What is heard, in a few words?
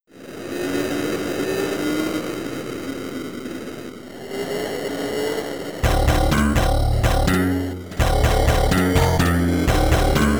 Story Sequence